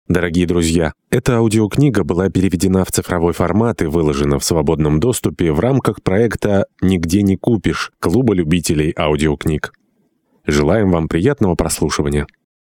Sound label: Jingle